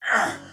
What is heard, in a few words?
Pain, Soldier Male; Dialog; Barks; Battle; Sinecure